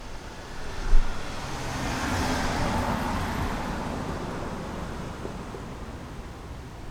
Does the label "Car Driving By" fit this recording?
Yes